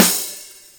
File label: Drum Hit Soft Normal